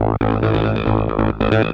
vfx 138 a, Techno, vocal-fx; Techno ID; atmo+fx-loops

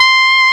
SOFT SAX 3

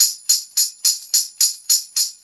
Brass Tamb, Perc Loops; Sonic Foundry; Drum Tools

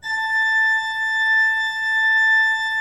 A5, Cathedral Organ; ILIO; Propeller Islands

